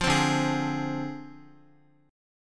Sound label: D-Minor 7th Flat 5th, D, Guitar, 4F, 4A, 5C, 5G, 5C, 6E; Listen to Dm7b5 strummed; JGuitar